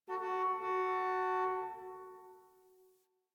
Parking Lot Distant Car Horn

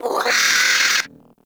Vocal Angry; Hyena Micro

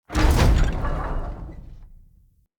Pollution Tower Furnace Door Start

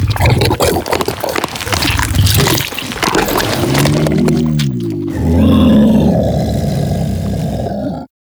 Truffle Eat; Warframe; Infested; Juggernaut; Truffle Hunt

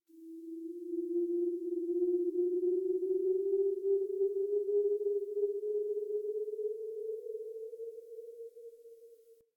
Venus Landscape Distant Corpus Texture; Ambience; Warframe; Venus Open World

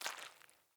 Boot Walk Water, Footsteps; Enemy; Sinecure; Melee Grunt; Boots

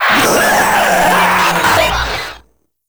Proto Infested Runner Vocal Attack; Warframe

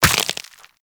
Operator Walk Bone, Footsteps; Warframe

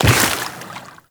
Run Water; Footsteps; Warframe; Tenno

